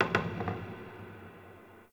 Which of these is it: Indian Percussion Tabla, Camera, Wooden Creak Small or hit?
Wooden Creak Small